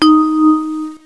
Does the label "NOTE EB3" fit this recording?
Yes